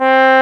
BRS BONE, Trombone; Roland L-CDX-03 Disk 2

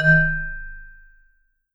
V SHRT MF D#2, Marimba & Vibes; Sampleheads; Dave Samuels

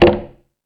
Tool Kit, Drum Machines E-MU Pro-Cussion

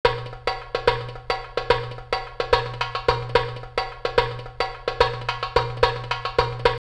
Alger 108, drumloops World & Ethnic; X-Static Goldmine

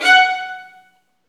STR VN, Roland, STR Vls Marc

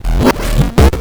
grind, EMU XL-7

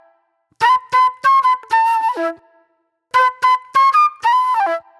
Flute

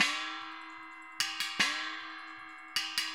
GONG, RITUAL 152; ILIO - Ethno Techno

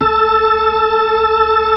B-3, Full Xfade

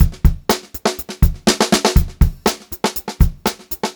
121 funky, Funk-Groove Drumloops; USB Soundscan vol.38; AKAI; 90 s Sample CDs

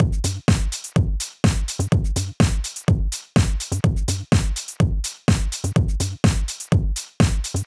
Drumloop, 125bpm, Nu Disco; Zero-G; Construction Kits; Speedy

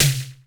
PTX PER; Crypton - DrumBase5000

